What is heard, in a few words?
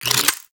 Pistol Reload; Warframe; Sapient